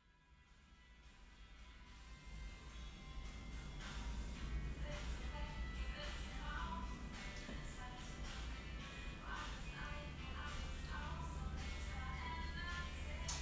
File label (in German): musik nebenraum